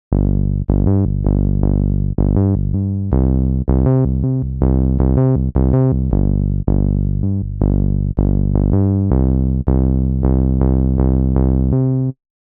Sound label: Synth Bass